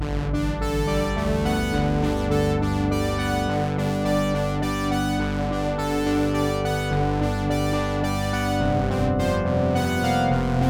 G arpeg 108

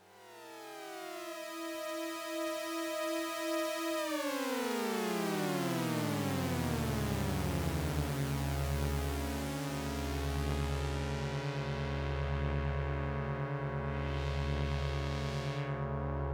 dissonant

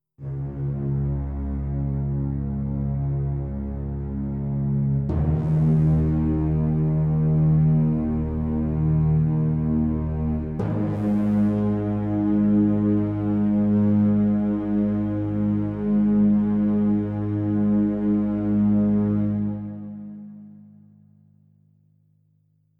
Eb drone orch

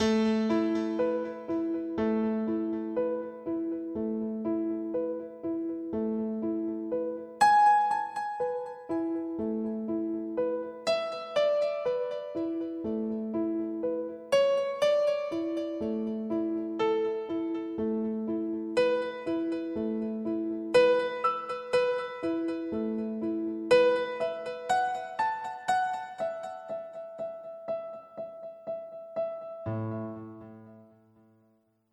piano arp; chromaris

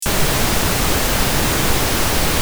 pinknoise

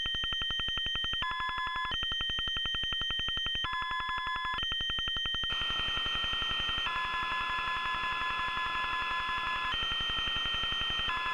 dissonant noise

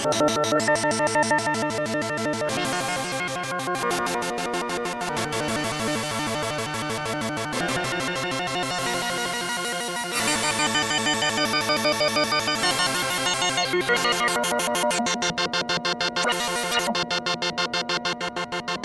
D fifths

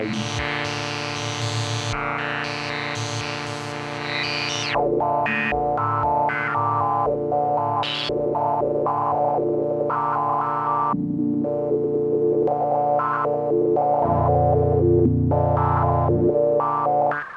Bb pulse 114

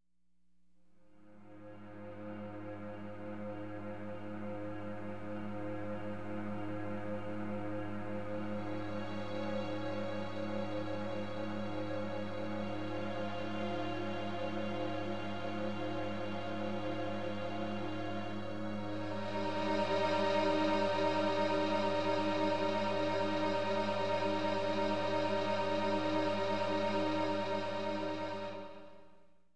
jelly drone

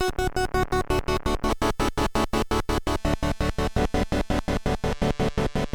D pulse 86

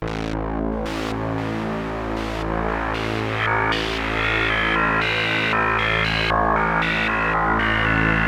A pulse

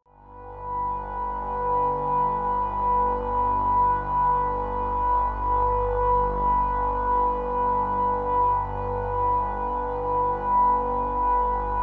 B drone

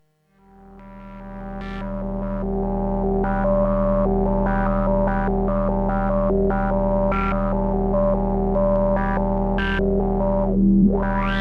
G pulse 72

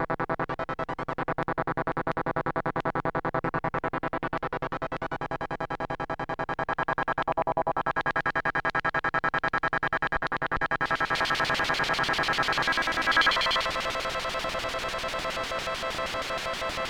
C pulse

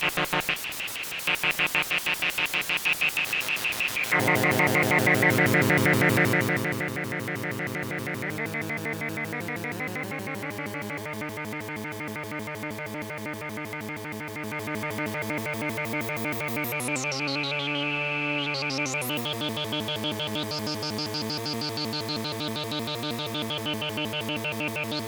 dissonant pulse

